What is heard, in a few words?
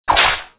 sword swing